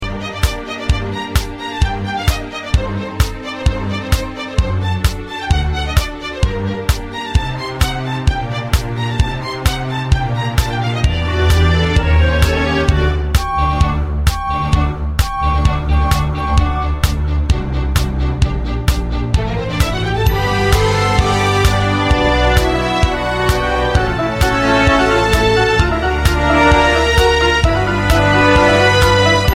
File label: Orchestra minus lead; Philharmonic Orchestra